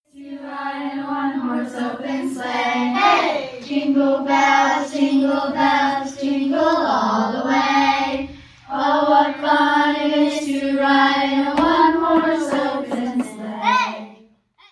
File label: Jingle Bells